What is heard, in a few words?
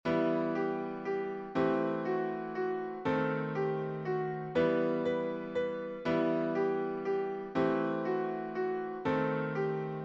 Melody, piano